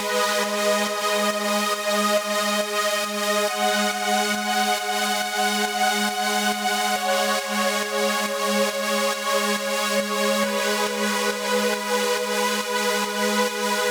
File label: Pad 138BPM, G# minor; Bassline & Melody; Vengeance Samples; VTS1 Zodiac Kit